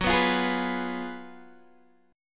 Gb Major 7th Suspended 2nd Suspended 4th, down, Guitar, Open G